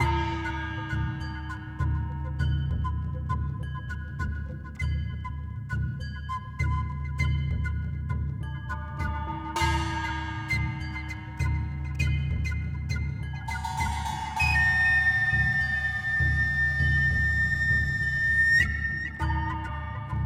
Music Boss Fight Intensity Medium; Sifu; Boss Hideout1; BossFight Phase=Phase2

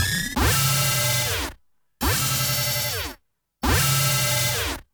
WARNING !; Darius

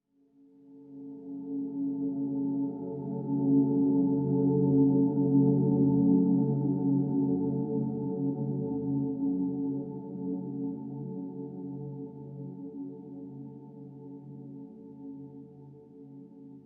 Swell Tone; HDD Theme Scull Swell Tone 03a; inside

